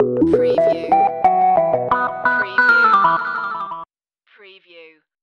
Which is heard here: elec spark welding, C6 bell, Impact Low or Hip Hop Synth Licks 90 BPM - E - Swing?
Hip Hop Synth Licks 90 BPM - E - Swing